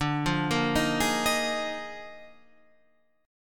D sharp Major 7th Suspended 2nd Suspended 4th, D, guitar chord